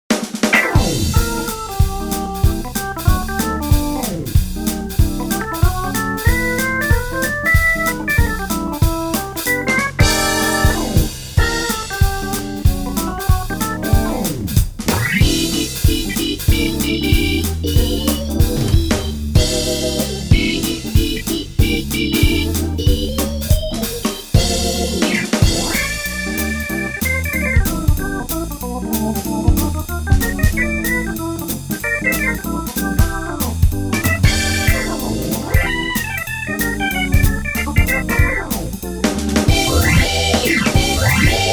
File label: harmonic bar